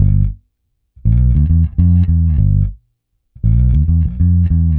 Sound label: Bass G Funk